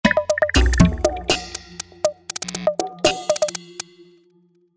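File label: game background music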